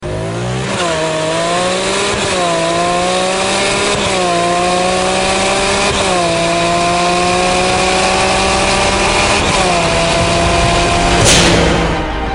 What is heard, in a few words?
Lambo Aventador